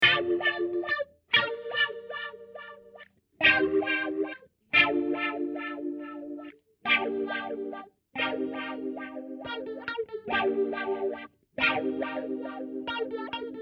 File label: wah wah, 70